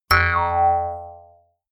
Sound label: mouth harp